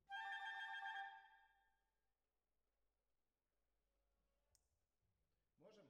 Violas Over

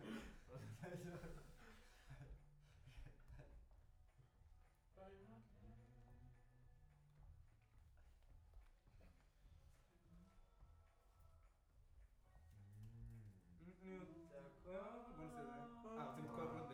Choir Far

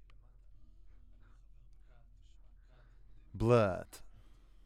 Voc OD

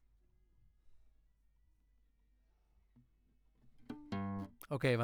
Classic Guitar

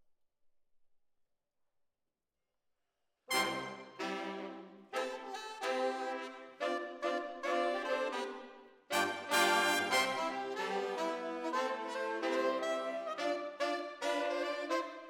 ORTF, Jazz for piano

